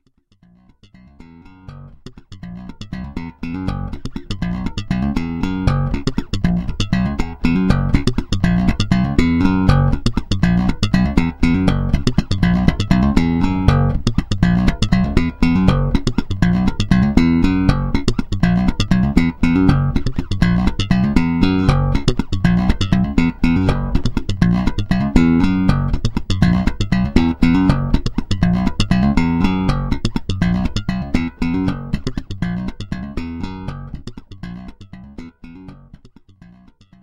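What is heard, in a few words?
bass fingerstyle slap both pickups active; f bass VF-5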